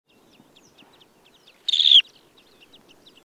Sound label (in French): becasseau variable